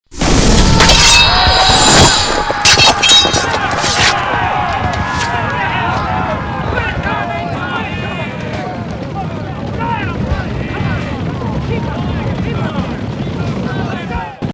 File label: Major Combat